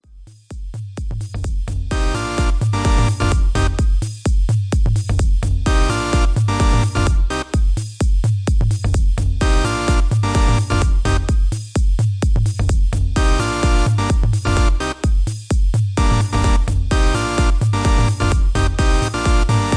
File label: Synth, Ambiance